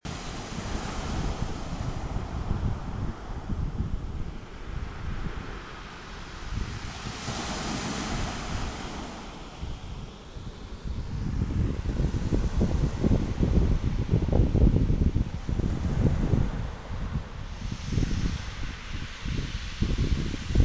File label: freshwater bay